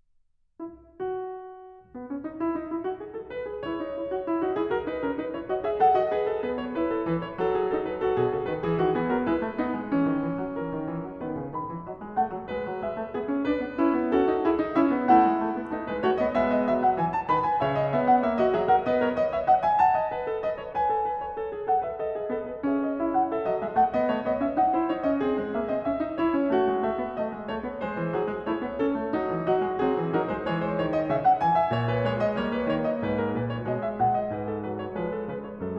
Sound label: Well-Tempered Clavier, Fugue No. 9 in E major; Bach; Book 1; BWV 854